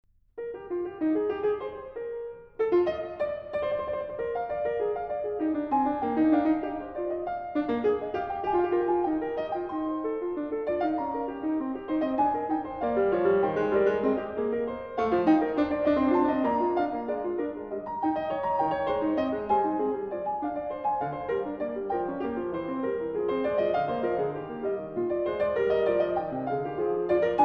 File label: Fugue in E-flat, The Well-Tempered Clavier; BWV 852; The Well-Tempered Clavier, Book 1; Angela Hewitt